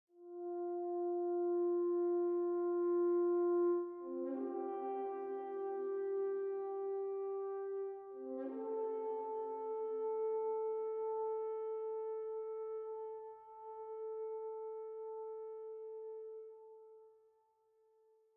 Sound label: Horn 58bpm 4-4